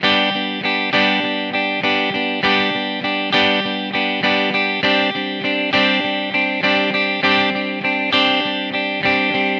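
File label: Guitar Pop G major 100bpm; Guitar and Bass; Ableton; Factory Packs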